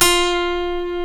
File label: G, funk guitars; Spectrasonics; Hans Zimmer Guitars VOL-1; TAKA FUNK